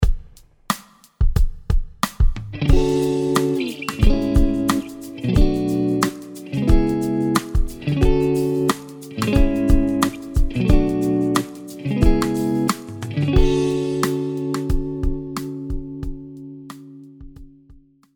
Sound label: D Lydian Tonal Center; guitars; key centers vs tonal centers